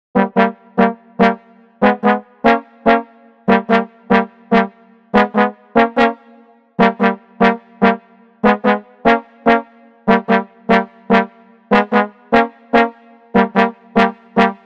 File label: Underscore, Balkan Brass; Narrative Mind